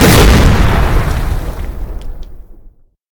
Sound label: rocket impact, weapons